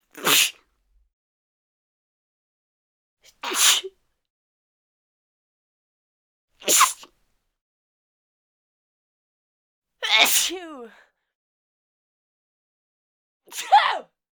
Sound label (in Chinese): 喷嚏女